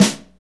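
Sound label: SNR snare, Motown; Northstar - Drumscapes Roland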